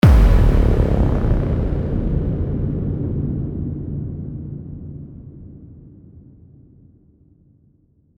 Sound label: game over boom; the jewel weaver